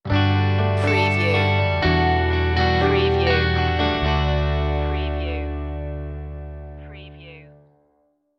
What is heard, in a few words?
stinger, rock, moderate